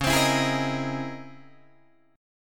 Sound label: Db Minor Major 13th Db Guitar DADGAD; DbmM13 strummed; DbmM13 chord; JGuitar